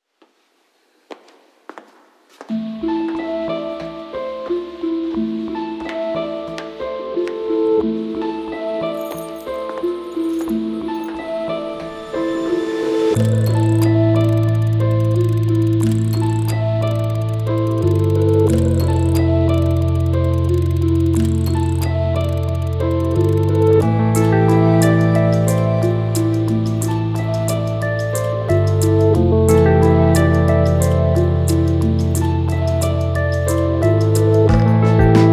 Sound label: Overture (instrumental)